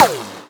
ricochet, bullet impacts; physics; Half Life Model Pack

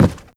step run, footsteps cardboard

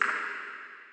distant drip, water; ambient

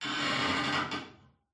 wood creaks; Half Life Alyx

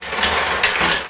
drone ladder release; scripted sequences

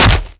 step close, zombie blind

